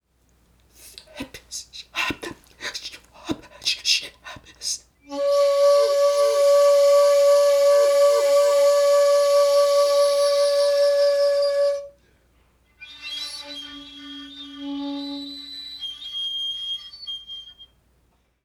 Voice sound

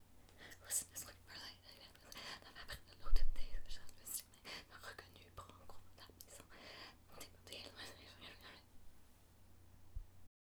Voice sound

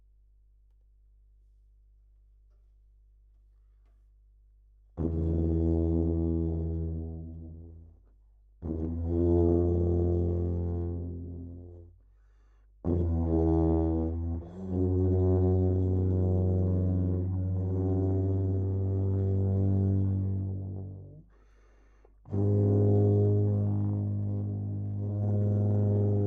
Trombone sound; Jakob Munck

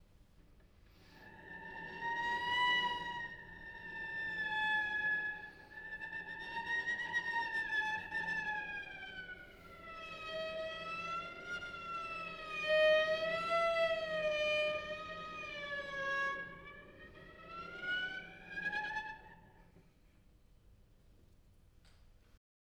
Cello sound

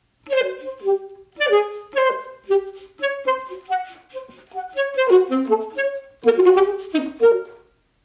Saxophone sound

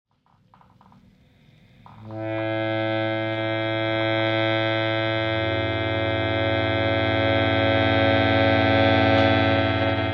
Accordion sound; Maren Sofie Nyland Johansen

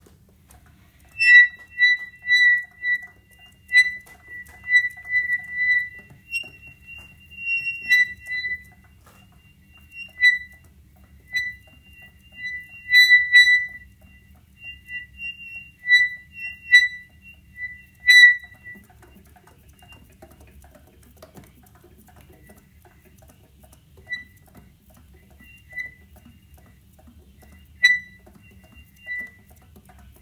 Saxophone sound

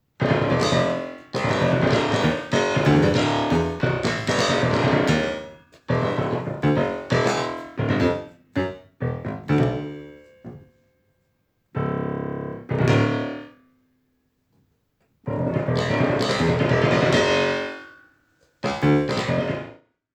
Piano sound

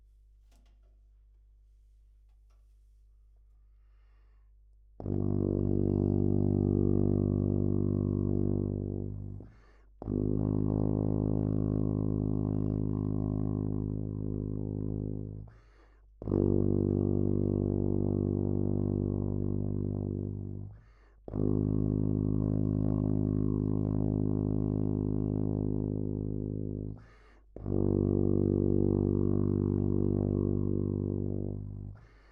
Trombone sound; Jakob Munck

